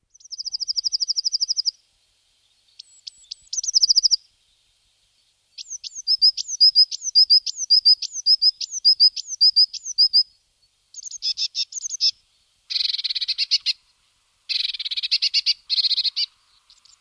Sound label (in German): blaumeise